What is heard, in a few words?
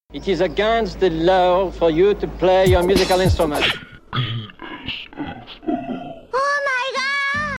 MUSIC INSTRU JINGLE